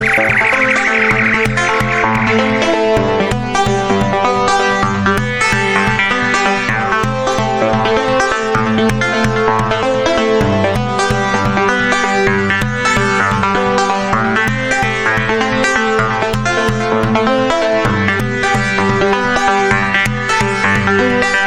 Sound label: my pianno